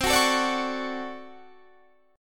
D Major 7th, chord; Dmaj7/C; strummed; JGuitar

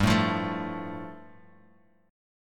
G 7th Suspended 2nd; strummed; JGuitar

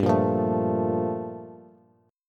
G Minor 9th, Bass; Gm9 strummed; 15,13,15,14; JGuitar

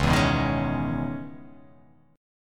C sharp Minor 9th, chord; x,4,2,4,3,3,3,x

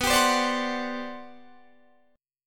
G Major 11th B

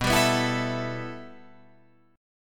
B 7th Suspended 4th Sharp 5th, Guitar, Drop D

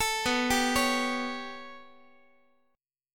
B 7th Suspended 2nd Sharp 5th down Guitar, chord; B7sus2#5 arpeggiated; x,x,12,9,11,12; JGuitar

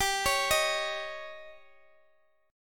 Eb 7th

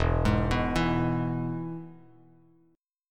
E-Major E, Bass Standard; arpeggiated; JGuitar

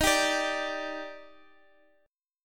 D sharp Major 7th, Guitar, Drop D; x,x,x,8,8,10; chordshape

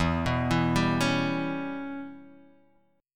E Minor 6th E

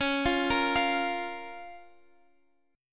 Db 6th Db, down, Guitar Half Step Down; arpeggiated

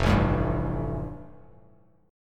F Major Add 9th, Bass Standard; Fadd9 strummed; JGuitar

